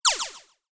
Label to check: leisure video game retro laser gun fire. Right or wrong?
Right